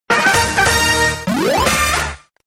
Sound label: mystery win sound; jackpot 6000